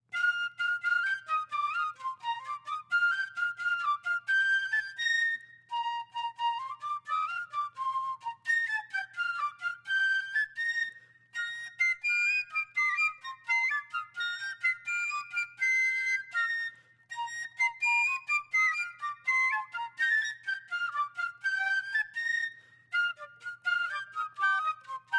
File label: Fife; Over the Water to Charley